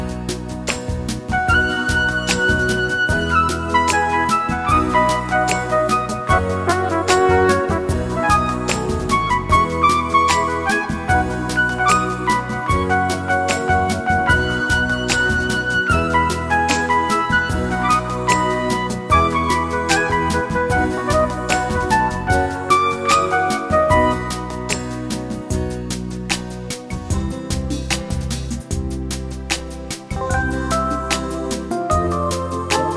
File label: Pop Ballad